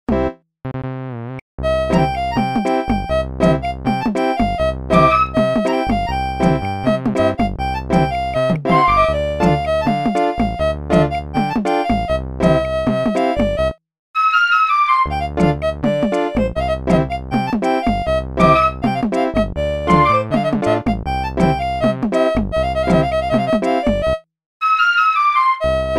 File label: nokia arabic ringtone